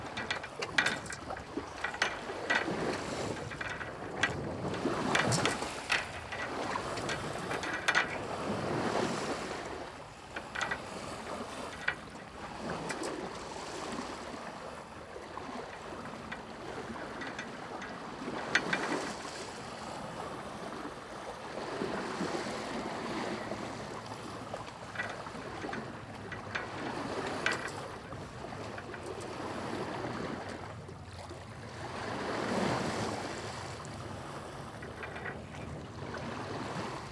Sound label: Sailing